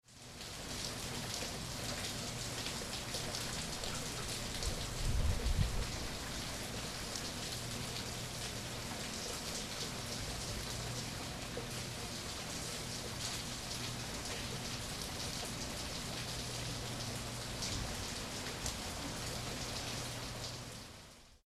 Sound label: Rain